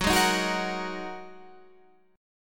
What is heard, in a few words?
Bb 7th Suspended 4th Sharp 5th Gb